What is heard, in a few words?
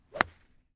golf club flub